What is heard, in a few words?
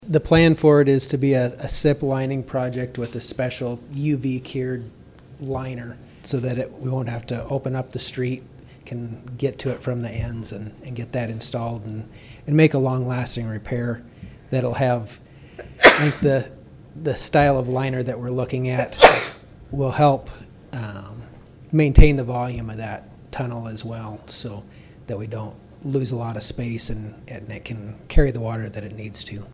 storm